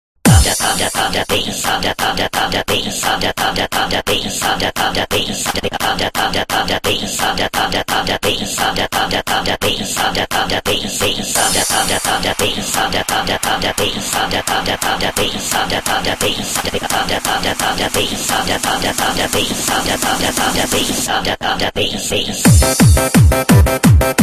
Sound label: pound bass